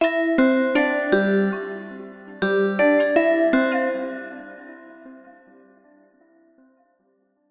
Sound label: musical big ben chime synthesized delayed space bells; zapsplat